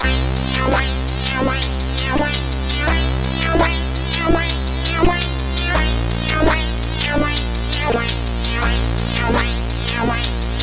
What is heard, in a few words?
Takt, CSG8580R5